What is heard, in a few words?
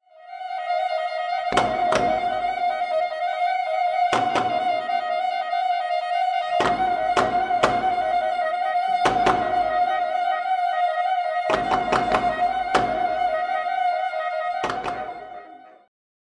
Percussive Slaps, saxophone; An Exploration of Powerful and Unusual Saxophone Effects